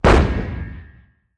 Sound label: 175mm Howitzer: Fires With Metallic Ring Out. Stereo